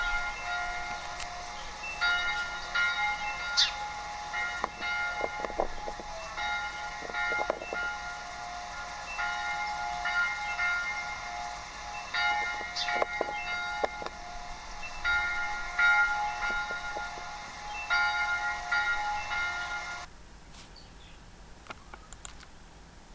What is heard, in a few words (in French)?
cloche glas; LUSSERAY